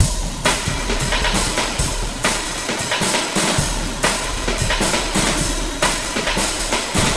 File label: Groovy Drumz 134